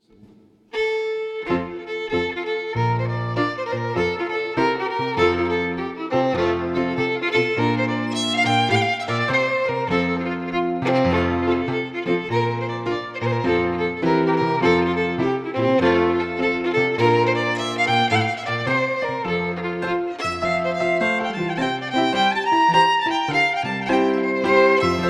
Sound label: Jig, Scottish Fiddling; John Morrison col